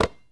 Street Ball Catch; SFX Kit

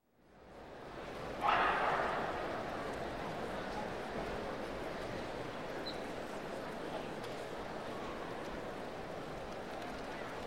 Track and Field; Sound Ideas 2000 Series

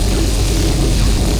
Sci-fi Electricity Hum Loop; SFX Kit; Sci-Fi Loops

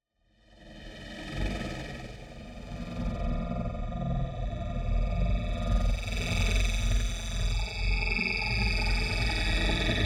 NEON AMBIENCE HIGH; Floating Electrons; boom library

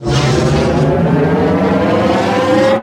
Powerup Scifi; Energy & Forcefields

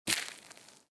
footstep gravel; A Western Drama

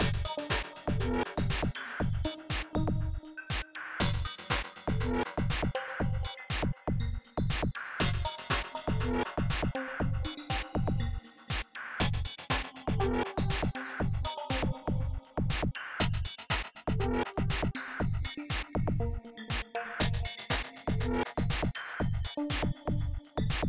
electro loop; moodsounds; Audio Game maker Sound Library

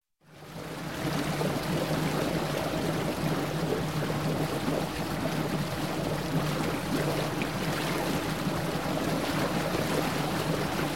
Water Lake Marine; Sound Ideas 2000 Series

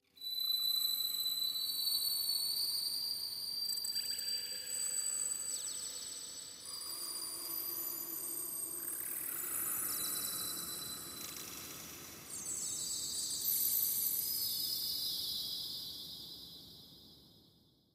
Magical Dream Tone Pad Musical With Bird Ambience; Hollywood Edge; sound designer's toolkit v2

